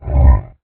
creature roar; RPG

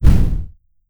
explosion; NIIIEMAND's explosion sfx

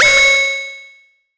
metal; Free SFX for anything

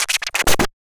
Crack Scratch; FREE SFX PACK VOL 2

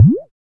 Blob Richochet; FREE SFX PACK VOL 1

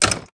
slam

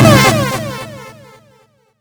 blow, electrical

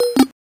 Coin Pickup; FREE SFX PACK VOL 1

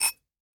Ceramic Glass Scratch; Sample Growing Stream 002; Ear Candy

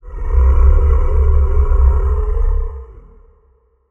Deep Growl; Horror Sound Pack

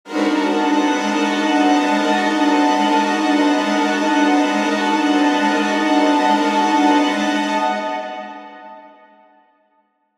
Chase Strings High, Horror Strings

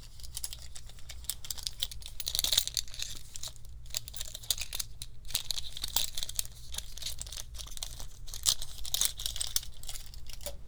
Rummage, Toolbox Rummaging

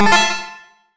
Retro PickUp; FreeSFX; GameSFX

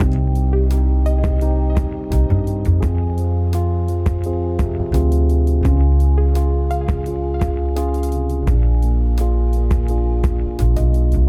Smooth Jazz Loop; BB 2HTC Samples Vol 4

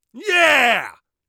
excited, Warrior Voice; Warrior Voice Pack

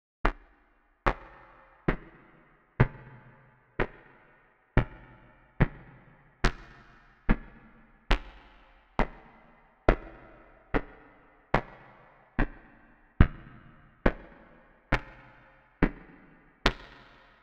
Footsteps - Slow; Horror Sound Pack

